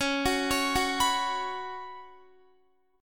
Db 7th Suspended 4th Db down Guitar; arpeggiated

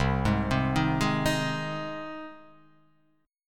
C Minor Sharp 5th, chord; arpeggiated; JGuitar

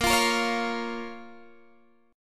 C sharp 6th, A sharp

